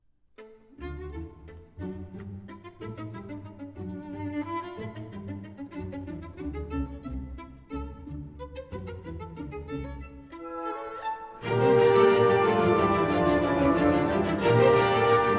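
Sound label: Violin Concerto, Symphony Orchestra; Violin Concerto Op 33 IIb Rondo Allegretto scherzando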